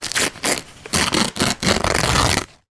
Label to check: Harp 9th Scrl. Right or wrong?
Wrong